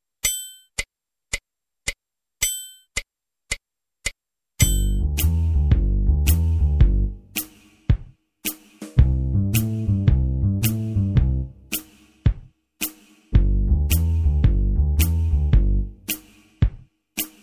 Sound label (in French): bande orchestre blues en sol